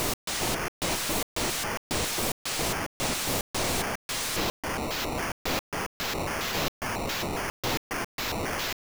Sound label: 8bit